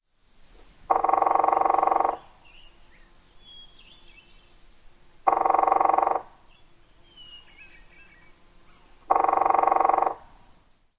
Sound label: drums red-shafted